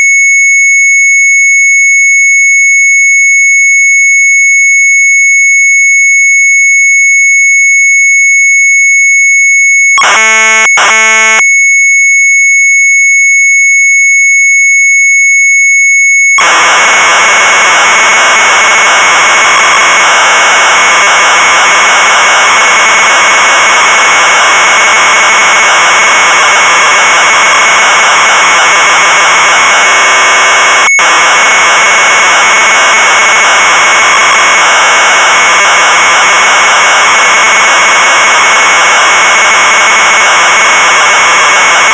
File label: MZ800 Tape; CMT OPTELSOM; sharp; retromelsarchive